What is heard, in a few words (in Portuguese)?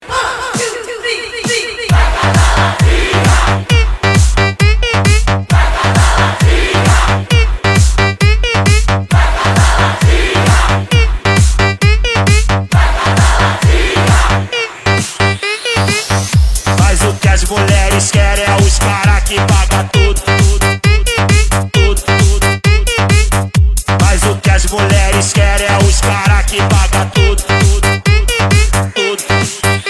eletro funk